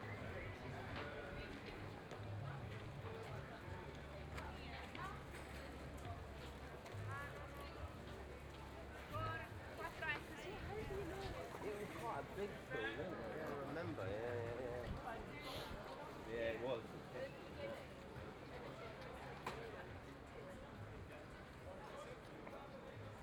open air market, scenes; IEEE AASP

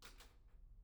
pendrop; IEEE AASP

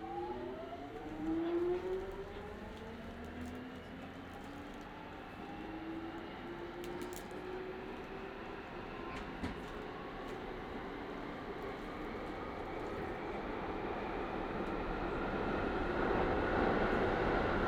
tube, scenes; IEEE AASP

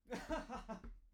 laughter; IEEE AASP

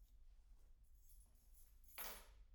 keys; IEEE AASP

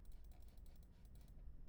mouse; IEEE AASP

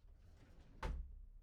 drawer; IEEE AASP

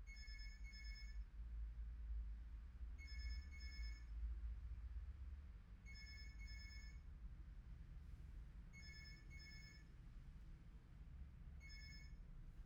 phone; IEEE AASP

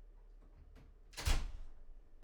door slam; IEEE AASP

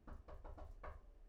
knock; IEEE AASP

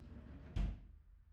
drawer; IEEE AASP

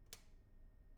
switch; IEEE AASP

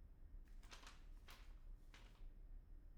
pageturn; IEEE AASP

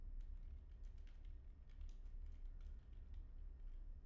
keyboard; IEEE AASP

